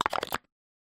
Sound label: smalll wooden box close